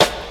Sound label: clear acoustic snare g sharp key; snare drum samples